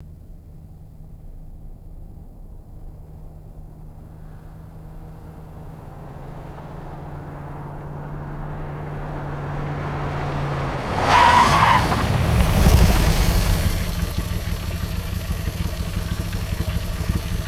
vintage car fast approach stop and turn off; vehicles

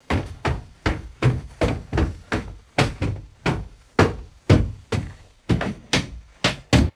footsteps on hollow metal stairs

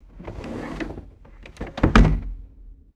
wooden antique drawer sliding shut

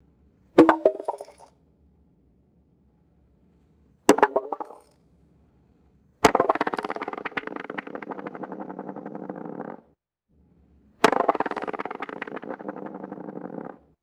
rock dropping and bouncing on concrete; rocks

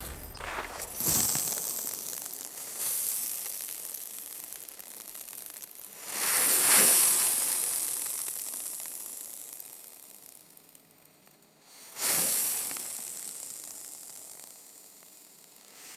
icy snow debris thrown and scattering

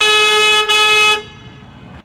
quick double vehicle horn honk

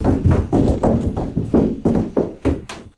heavy tumbling down wooden stairs

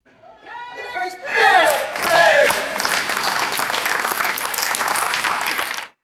small crowd clapping and shouting bravo

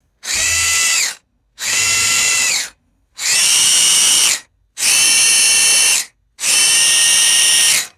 short buzzy servo motor bursts

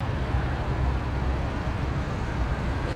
distant urban traffic and heavy air rumble

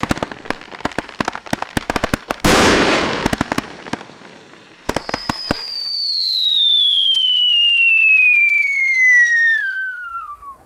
firework crackling whistle and bang

